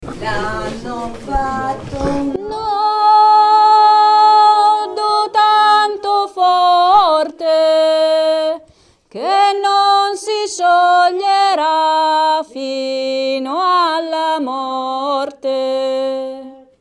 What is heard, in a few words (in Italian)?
soprani e tenori; Catenelle; Ganges